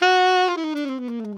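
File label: alto long fall F#3, saxophones; Greytsounds - Philip Wolfe David Crozier's Saxophones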